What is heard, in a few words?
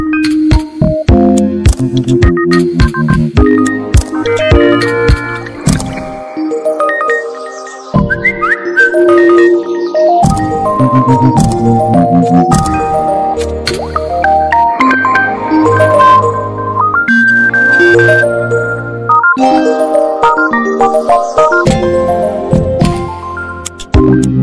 new samsung original ringtone remix